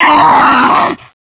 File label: charger die; umbrella